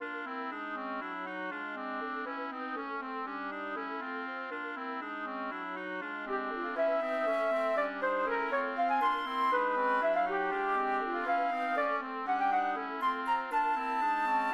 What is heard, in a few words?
quartet for winds; chamberbowling